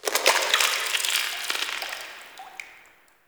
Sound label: Interior Drip Goop Run; Ambience; Indoor; Warframe; Infested Eris